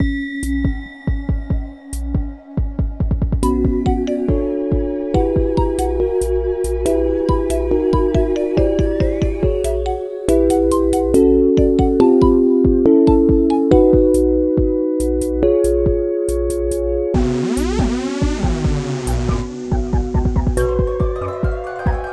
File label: Song karaoke version